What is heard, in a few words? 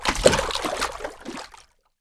water splash